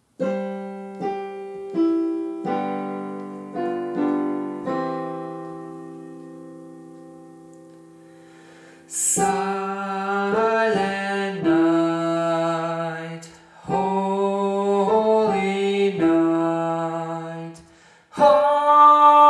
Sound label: Soprano